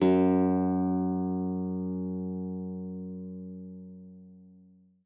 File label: F#2, GUITAR; Xsample VOL-2